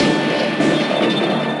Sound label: Desert Percussion; G.I. Joe The Rise of Cobra; NDS